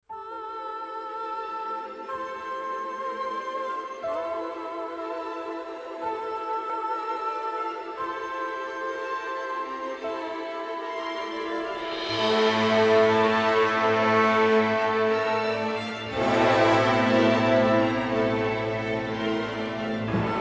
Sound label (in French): Bulgarian Symphony Orchestra generique fin musique du film; Les Oubliées; Cine Music